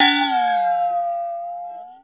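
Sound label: ART SHELL, percussion metallic; Rarefaction; Poke in The Ear With A Sharp Stick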